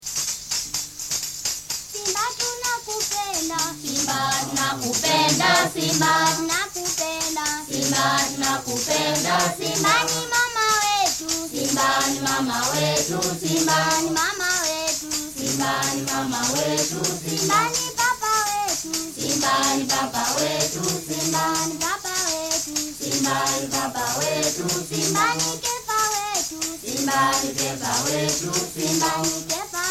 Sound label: Praise song with solos; Kenya